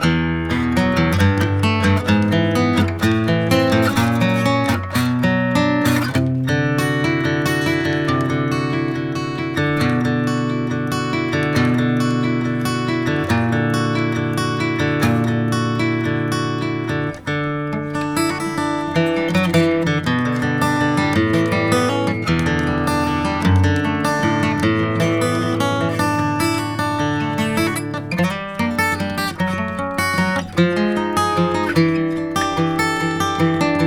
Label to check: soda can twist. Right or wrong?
Wrong